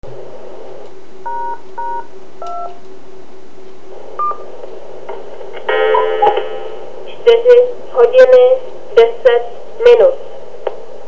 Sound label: air DTMF cmd cross cross; OK0BEZ